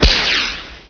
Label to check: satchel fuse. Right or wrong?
Right